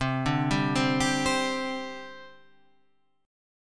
C Suspended 2nd, C, down, Guitar, Half Step Down

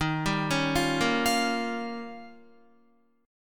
D sharp 9th, Guitar; D#9 arpeggiated; JGuitar

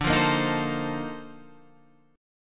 Bb Major 11th D

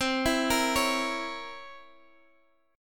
C 7th Flat 9th C Guitar Drop D

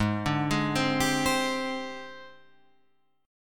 Ab Major 7th Flat 5th Ab, down, Guitar, Drop D

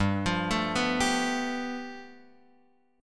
G 9th; G9 Chord {3 X 3 2 0 3} arpeggiated

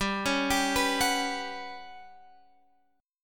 G Major 7th Flat 5th

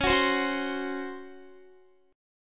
Db Major 7th Suspended 4th, Guitar; strummed

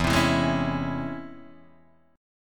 Db Minor Major 7th E

